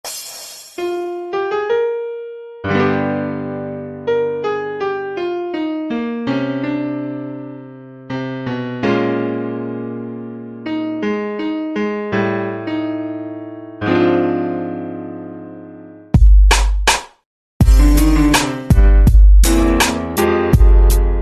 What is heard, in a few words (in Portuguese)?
instrumental piano e percussao